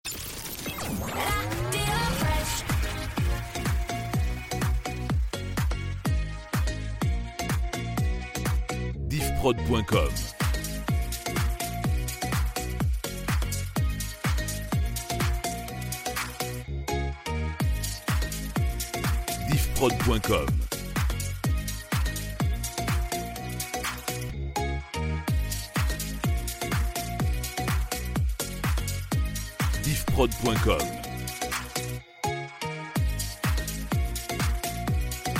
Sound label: Rampe Electro 125bpm